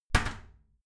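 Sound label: toilet lid slam regular, bathroom; haus-garten; badezimmer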